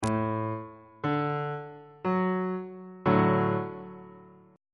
c double sharp major 2nd inversion

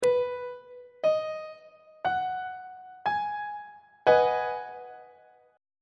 a flat minor 7th 1st inversion on treble clef

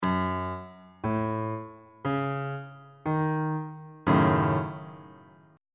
f sharp minor 6th chord on bass clef

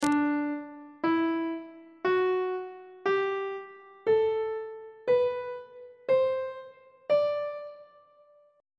d mixolydian mode on treble clef

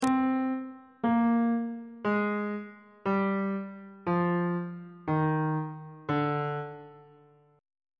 d locrian mode descending on bass clef

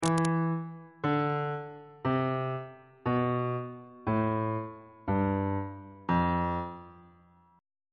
lydian mode descending on bass clef

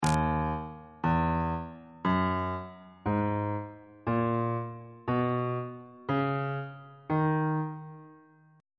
e flat phrygian mode

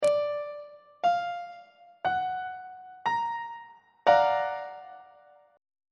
f sharp augmented major 7th 2nd inversion; on treble clef

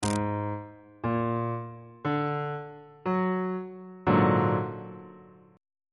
a sharp augmented 7th 3rd inversion on bass clef

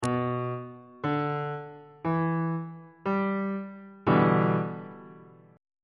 f flat minor 7th 2nd inversion

